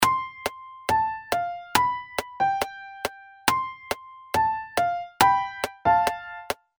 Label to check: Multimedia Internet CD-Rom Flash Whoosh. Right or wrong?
Wrong